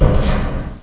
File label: grate, player; hl2